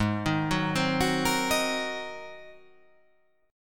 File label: G sharp 9th G sharp